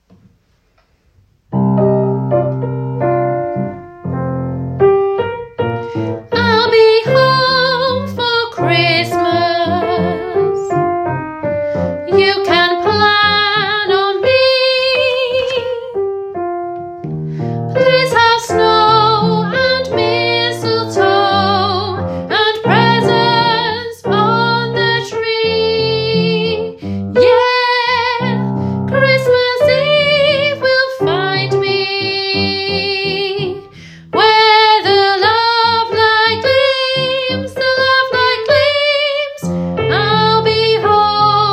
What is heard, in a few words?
bass